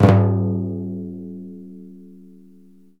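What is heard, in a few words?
deff finger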